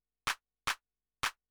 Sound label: CLAP HOUSE 125; eJay; Drum